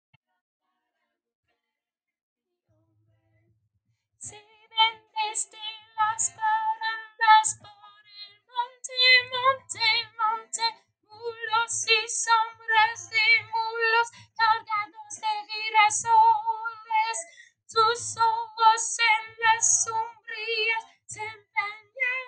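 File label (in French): Refrain thème voix aigue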